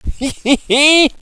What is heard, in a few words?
laugh